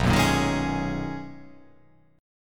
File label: B Major 13th B down